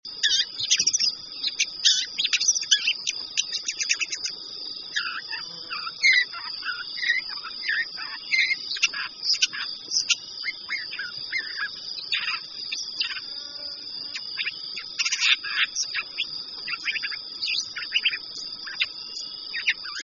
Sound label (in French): phragmite des joncs, chant